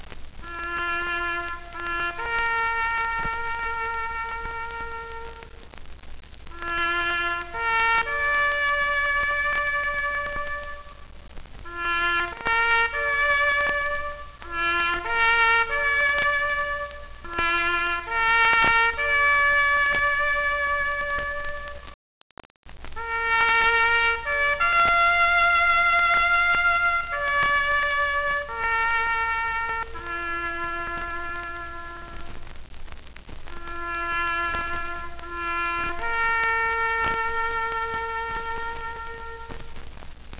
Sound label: taps, Bugle; Cliffside